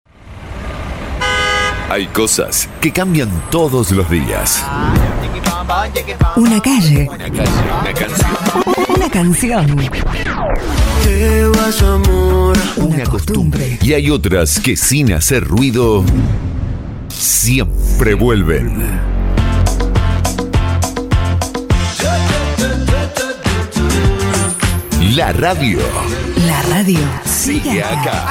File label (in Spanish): Arte Personalizado Atemporal Para Radios Version Pop; packs para radio